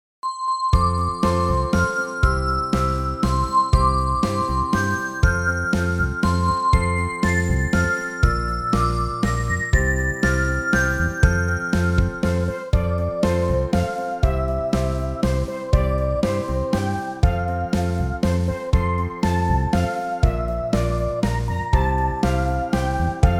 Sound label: music